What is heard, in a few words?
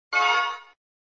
Sound SFX, flipper; sttng